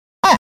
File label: Panda Grunt Single